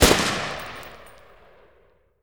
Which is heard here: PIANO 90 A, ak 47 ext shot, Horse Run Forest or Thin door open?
ak 47 ext shot